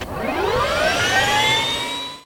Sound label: move; CosmicRage